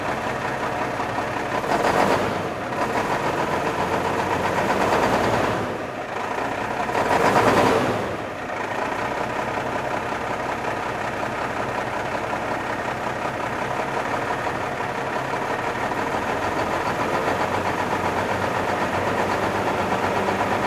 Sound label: Semi Truck